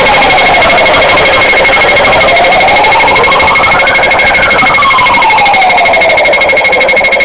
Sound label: signal sound